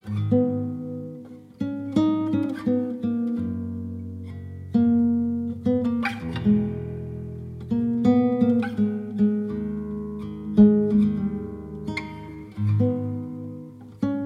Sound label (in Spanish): Guitarra